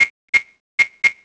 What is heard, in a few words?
c4 loop; Soldier Of Fortune II Double Helix; XBOX